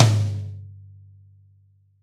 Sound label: Jazz Lo Tom